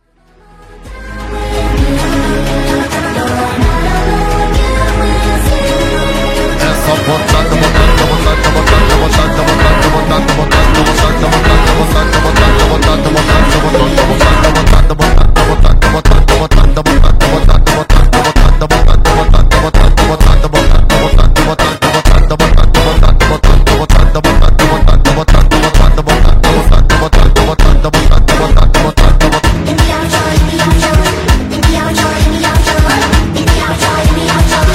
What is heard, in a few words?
Sleep Well Funk